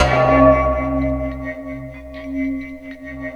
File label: DIAMANTS C2, SYNTH; Q Up Arts; Dream Experience